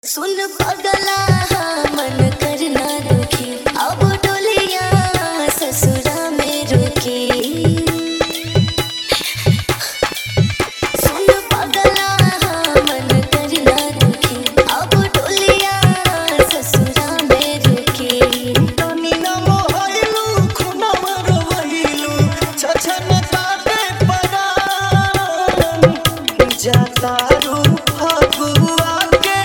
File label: Dj Remix Dhollki bass Holi sad